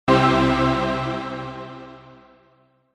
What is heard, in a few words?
achievement; captain backwater